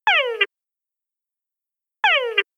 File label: forest tungara frogs